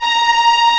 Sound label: Vio Trem 16 A#4; Digital Sound Factory Virtuoso